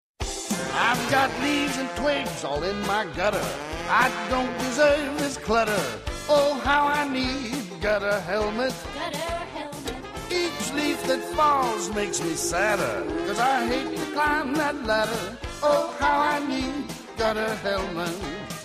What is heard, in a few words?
gutter helmet jingle